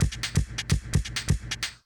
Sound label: Tech loop